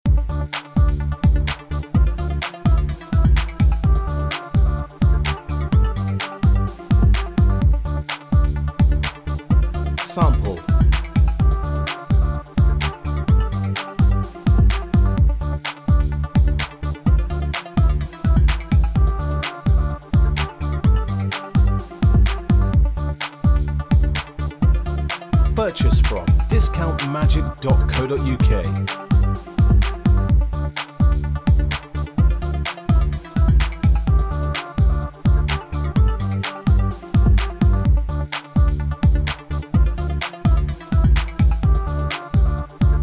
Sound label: electro, backing